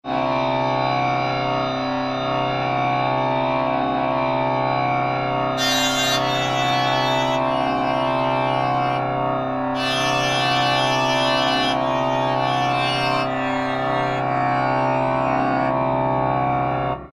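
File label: Tambura Drone; World; Roland SR-JV80-05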